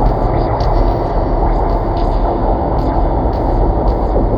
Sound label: pretty noise bed; Sonic Foundry (Sony Creative Software); Ugly Remnants VOL-2; UR 2 FX (Long)